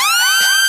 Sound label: SIREN